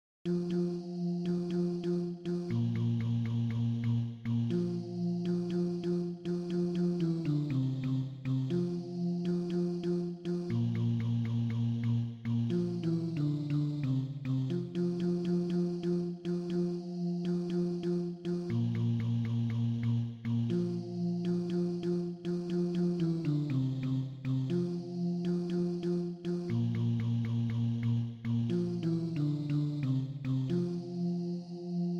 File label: ipharadisi bass, choir